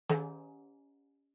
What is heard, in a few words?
Tambourine Head